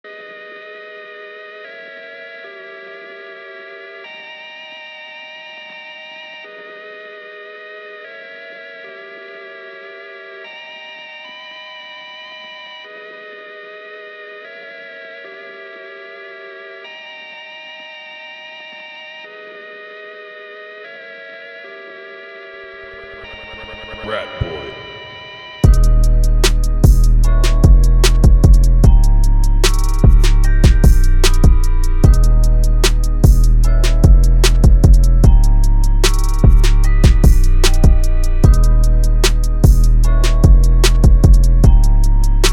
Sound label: chords